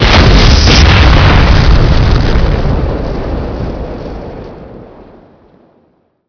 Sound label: bomb, weapons; quakemapping; mod quoth